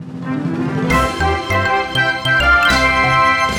Victory Win; bomsy linux macos windows gamerip 2017

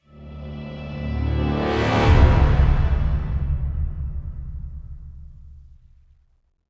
Action Low Clear; The Dark Knight Rises; Android, iOS

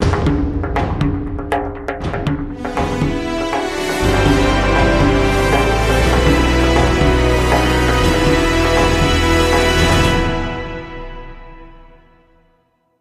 Jingle; SOCOM U.S. Navy SEALs Fireteam Bravo 2; PSP

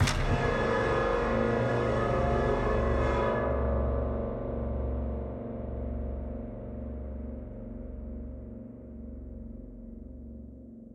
Stinger Escarpment; Unto The End; Xbox Series X|S

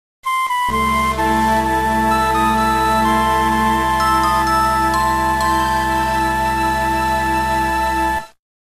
Jingle ~ Overcoming Sadness; Shining Force Resurrection of the Dark Dragon Original Sound Tracks

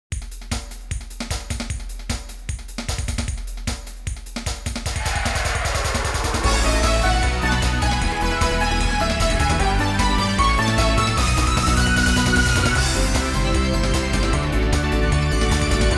Dance Music; Tales of Eternia

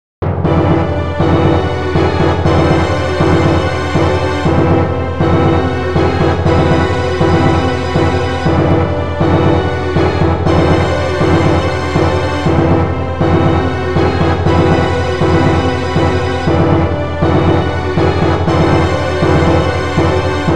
Unused Game Tune; Langrisser Milennium

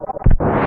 Killed

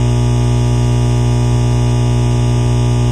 Electrical Loop Hum

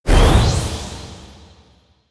Buff Attack

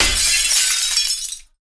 glass break

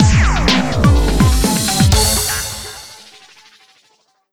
Level Complete Short Version

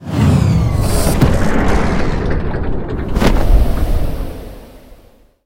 Jetpack outro; Talking Tom Gold Run

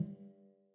Footsteps On Eater; Forest SFX

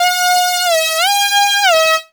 Beat

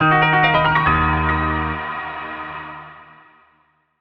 Retry Jingle; friday night funkin vs. infinite ost apparition melodies mod 2023

